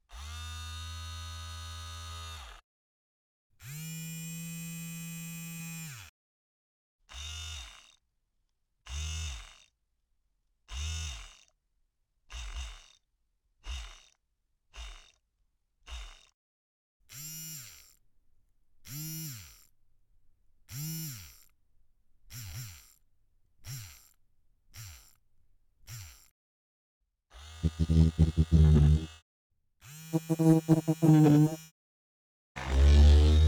Electric Servo

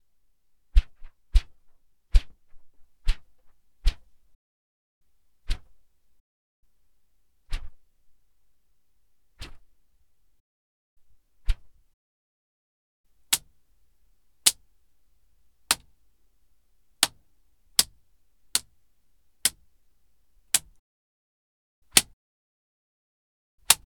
Flyswatter Swings Air Wall Collision

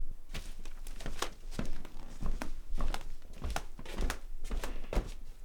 Flip flop

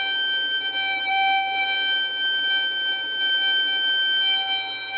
G 4 Pp Sul Pont

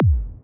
kick

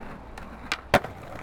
Skateboarder

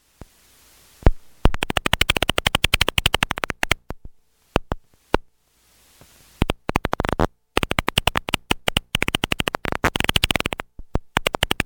Bat Echolocations Lost Lake

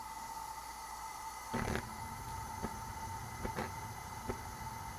Elements Fire

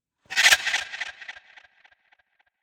Trailer Transition

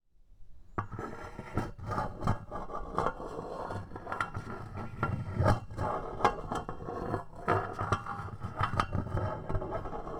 Metal On Metal

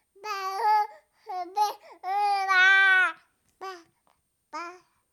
Baby Voice

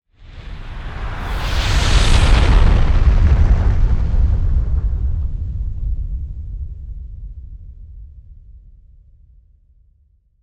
Huge Shock Wave Whoosh

